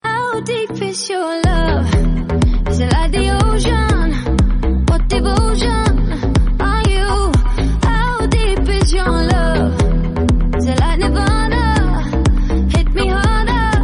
samsung s8 deep love ringtone

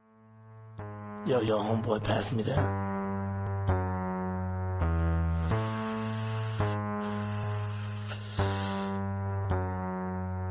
ringtone

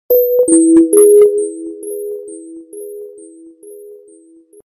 message tone notification

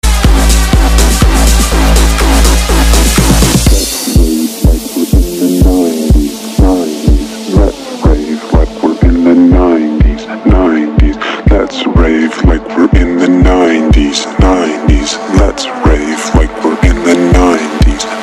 electro rave in the 90s ringtone

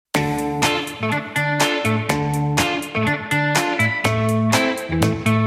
unplugged ringtone

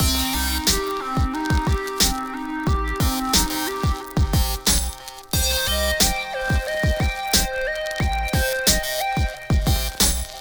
down beat tone ringtone

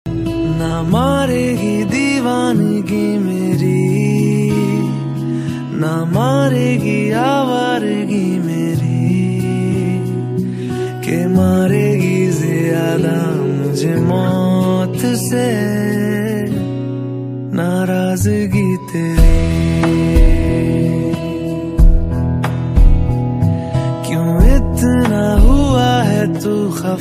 bollywood ringtone

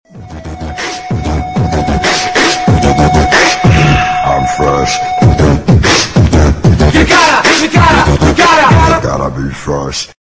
trance, ringtone

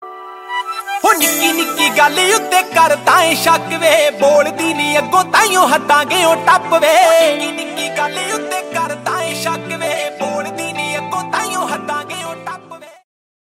punjabi ringtone

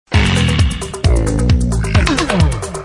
circus notification ringtone

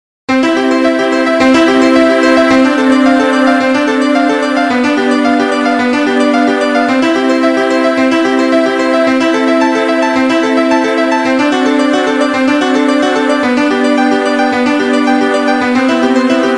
classical ringtone; bah

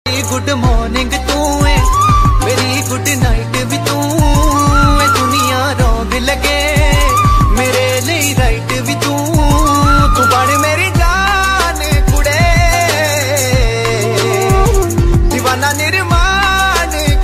bollywood ringtones

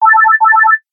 bell synthetic ringtone

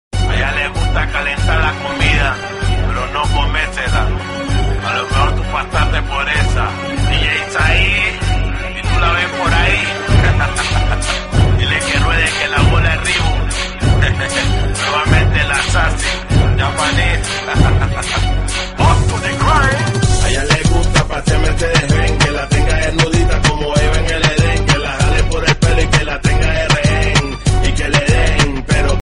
japanese lovely ringtones

